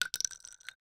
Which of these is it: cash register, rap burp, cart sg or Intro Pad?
cart sg